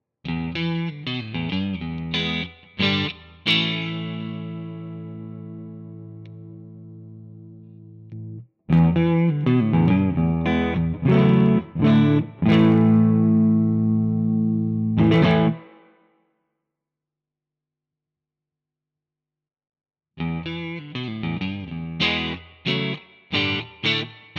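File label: Different Guitar Emulations Bridge Pickup; GTRS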